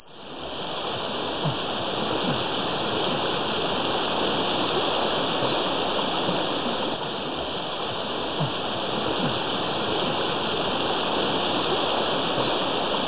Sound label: rain; Rain Anim